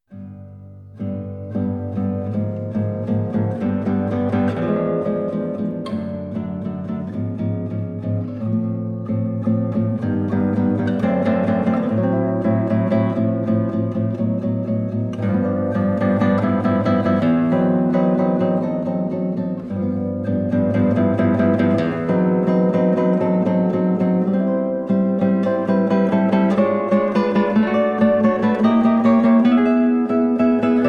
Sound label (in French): Etudes pour la guitarra No. 4 Des accords répétés; Un peu modéré